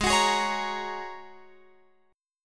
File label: G sharp 7th Suspended 2nd Suspended 4th G sharp Guitar Open F; G#7sus2sus4; x,15,18,15,16,17; strummed